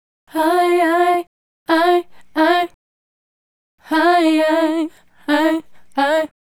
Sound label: Female Group, Hip-Hop and RnB Vocals